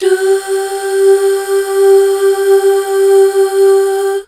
DUUH, BACKGROUND

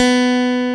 B2, GUITAR; AKAI S-Series CD-ROM Sound Library VOL-8

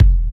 KICK, Tekno Industrial; East West

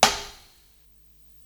Hihat, Psychedelic Drums

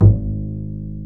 PIZZ BASS, Pizz Double Bass

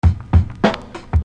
Beat, Hip-Hop Street Beats

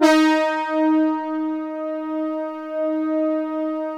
HORN, MATRIX 12; Big Fish Audio - First Call Vintage Keyboards

